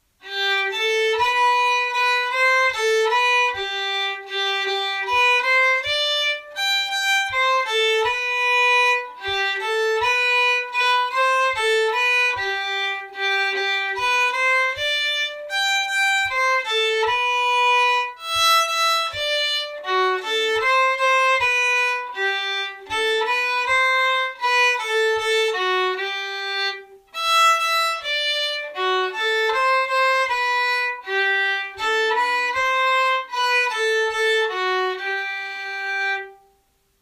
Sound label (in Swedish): Polska långsam; från Rämmen